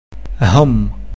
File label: hum